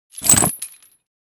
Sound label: Chain drop metallic impact hard